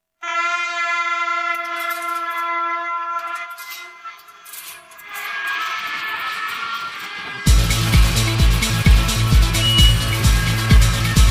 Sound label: no vocals